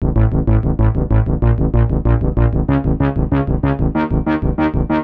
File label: Bass 95bpm A; horrorscape